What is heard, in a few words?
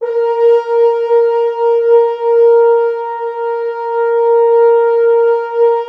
Orchestral Brass